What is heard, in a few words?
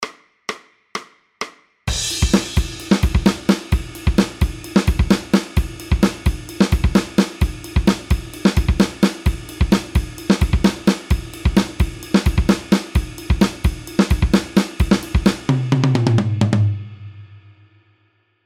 drum; logical beta